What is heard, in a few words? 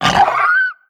run grunt, headcrab reviver; creatures; vox